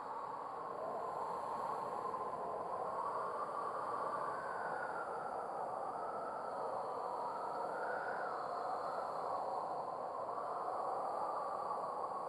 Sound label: Env Wind Edges Loop